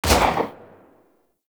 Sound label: Fire Shot, Weapons; Lato Redux